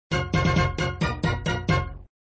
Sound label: Music Fanfare; after completing an INDY friend